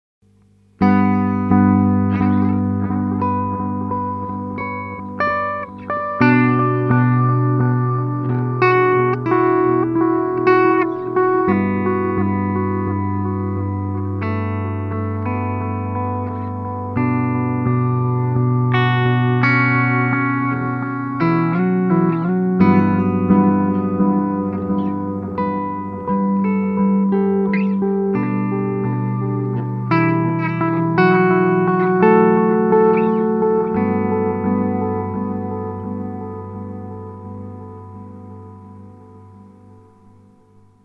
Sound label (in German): ADMini Analog Delay Sound maximale Delayzeit; Ibanez